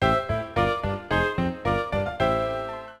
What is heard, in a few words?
Jingle Over; New Chuugaku Eitango Target 1800 DS; NDS